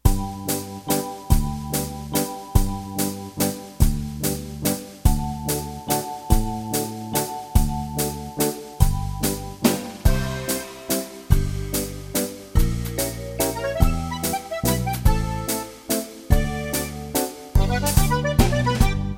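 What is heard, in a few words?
Musette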